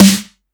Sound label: 909 Snare; Slate; Vintage Drum Machines Volume 3 909 Edition